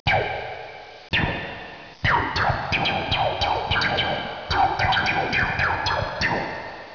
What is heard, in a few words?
fusion; 12000 Graphics Pack - A Complete Graphics Collection For Your PC